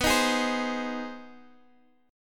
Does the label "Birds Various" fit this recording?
No